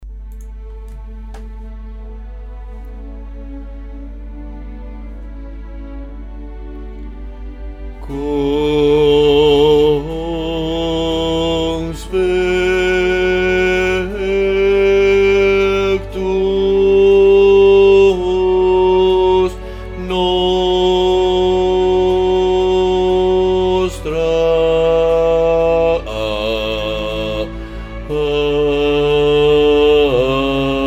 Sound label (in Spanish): MISION BAJOS; bandas sonoras